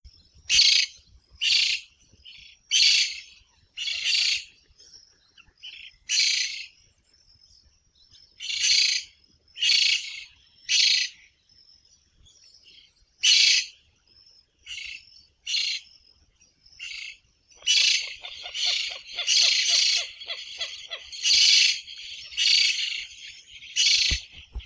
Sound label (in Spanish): chiripepe; Pyrrhura frontalis